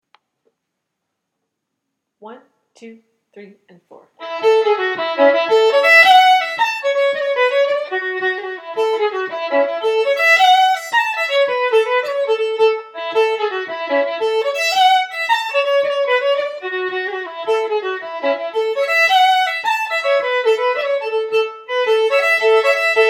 Fiddle At Tempo

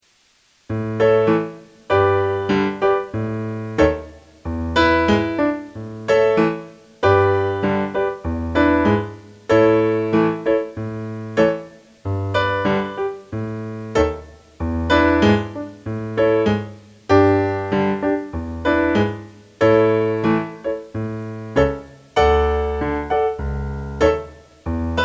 Piano with basslines Medium; Miss Charlotte Alston Stewart

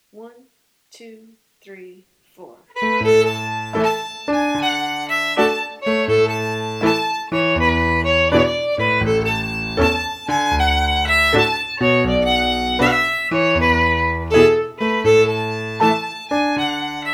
Fiddle and Piano

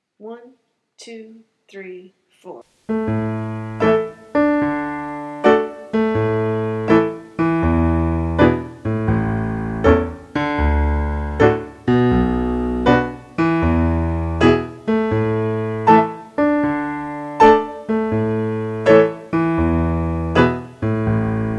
Piano Right hand off beat

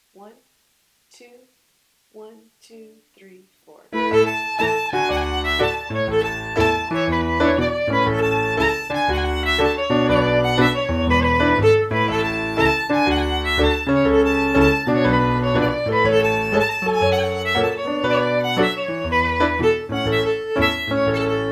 Piano and Fiddle At Tempo

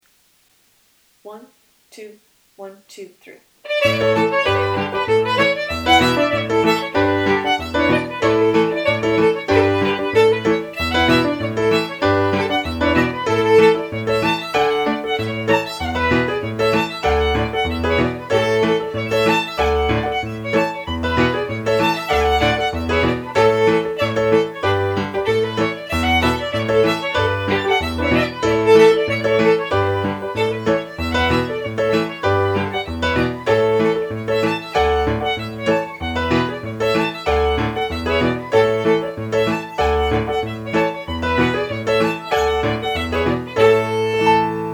Fiddle and Piano At Tempo; Miss Charlotte Alston Stewart

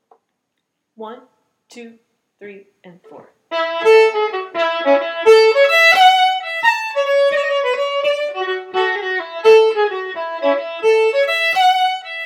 fiddle Medium

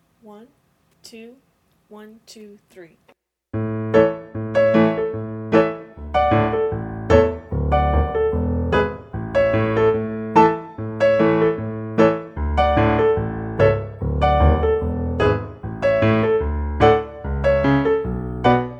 piano at tempo; Miss Wedderburn

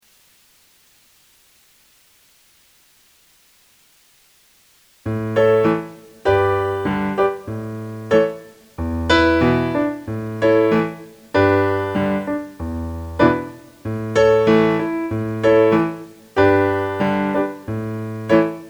Piano Medium; Miss Charlotte Alston Stewart

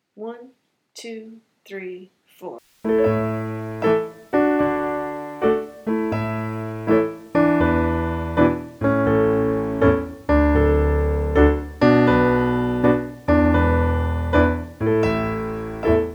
Piano Right and Left rhythm

